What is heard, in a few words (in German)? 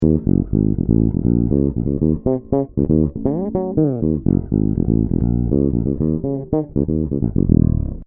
bridge PU passiv highcut